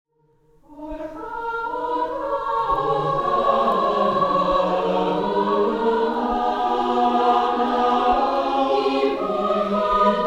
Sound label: chorale